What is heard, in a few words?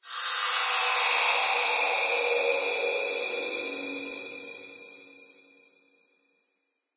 amb rand abstract hi; video games; ambient; random abstract; source engine; portal2